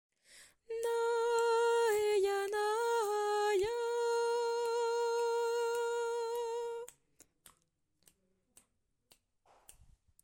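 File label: Ténor; Mesures 12 à 43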